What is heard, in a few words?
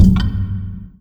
fs fm lightbridge, footsteps; player; Portal 2